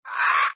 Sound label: shout; escape